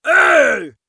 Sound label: ogre